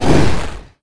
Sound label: dragon hit; Crypt of the NecroDancer